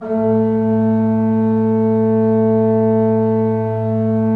A2, Cathedral Organ; Propeller Island

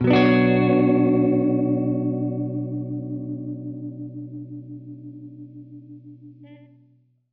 Gretsch Chord Bmin[add9], Guitar Chords; undercover samples

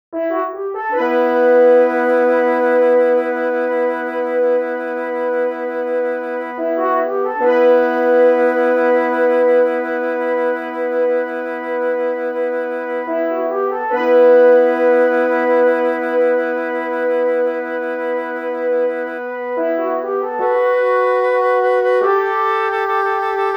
Horns Building; Cinematic Funk Urban Tone Poems; Sonic Foundry (Sony Creative Software)

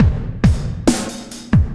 Landing Party Beat, Processed Drumkits

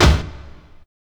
combi drums

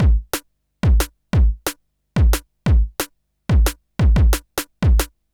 Kick Snare Loop

